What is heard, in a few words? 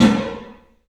CLUB SN VRB, 3D Drums; 3D Sound